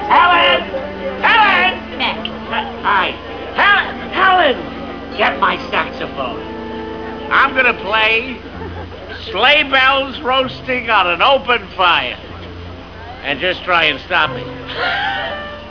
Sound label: sax; Laughter On The 23rd Floor